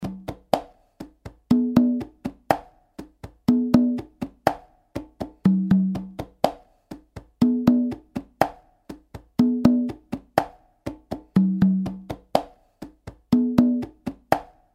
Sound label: congas; lateinamerika